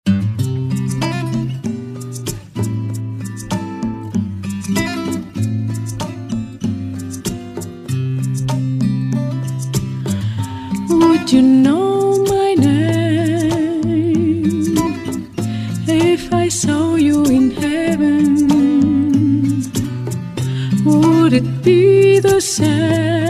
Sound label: Ballroom Rumba